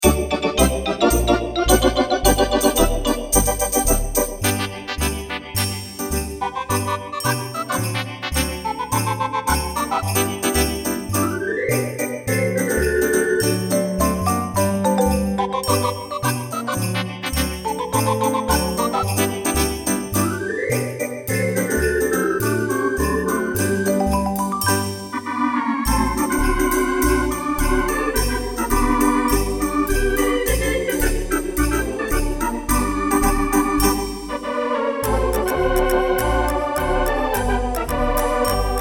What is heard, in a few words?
theater; at-900